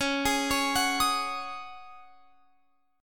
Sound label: C sharp Suspended 2nd Suspended 4th, C sharp, Guitar, Open F; C#sus2sus4; arpeggiated; x,20,20,20,21,22